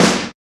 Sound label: SNR, Cheez Snrs, Roland; Club-50 - Foundations Roland